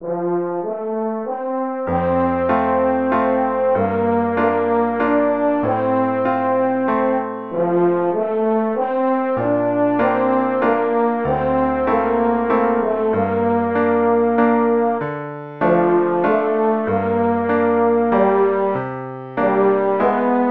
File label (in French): Vieille Cabane cor, Pour cor et piano; DEGRE CYCLE 1 (EASY); Fertile Plaine Éditions